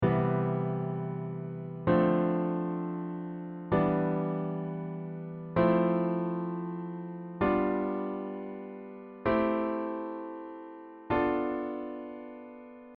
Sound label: C Maj Scale Chord